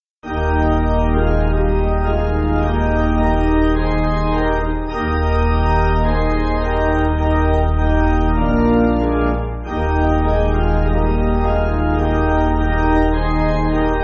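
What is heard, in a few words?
Pipe, Pipe Organ, Music Backing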